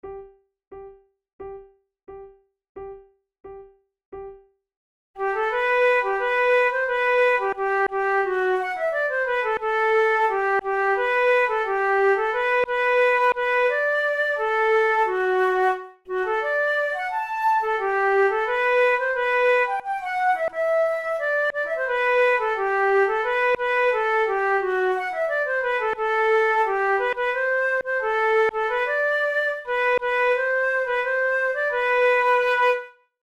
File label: duet; alice gray